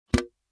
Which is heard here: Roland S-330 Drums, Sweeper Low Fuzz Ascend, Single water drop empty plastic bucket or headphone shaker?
Single water drop empty plastic bucket